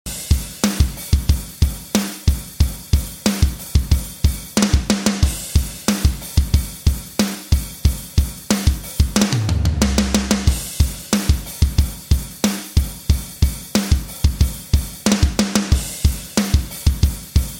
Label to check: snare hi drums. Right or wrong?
Right